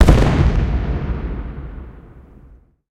aa explosion; War Thunder

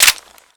MP5K reload hit, foley; Call of Duty Modern Warfare

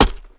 Chop Wood; Civ4 warlords

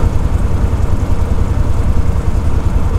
bearcat cockpit, engines; War Thunder

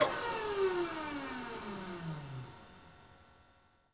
MiG-15bis Starter Pump End; DCS

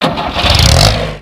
Vehicle Engines; Red Orchestra Ostfront 41-45

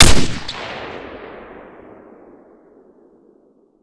famas; Modern Warfare

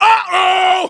vce uhoh fall

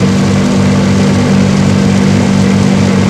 t64a engine rpm 99 load, tanks; engines; War Thunder

